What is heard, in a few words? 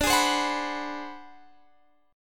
G sharp 7th Sharp 9th Flat 5th